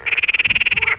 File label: bedriagae, frogs